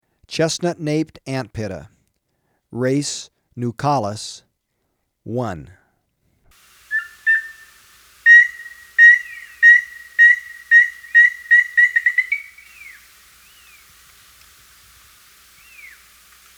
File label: Chestnut-Naped Antpitta, Birds of Ecuador; S. America